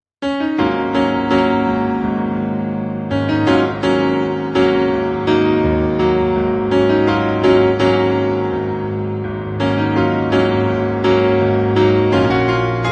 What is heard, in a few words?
Piano Cover